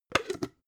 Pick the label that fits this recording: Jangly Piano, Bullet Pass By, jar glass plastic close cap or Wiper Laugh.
jar glass plastic close cap